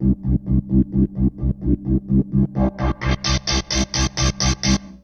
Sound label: Yamaha CS1x filtered riffs; FM 57 - The Ultimate Sample Collection (1997)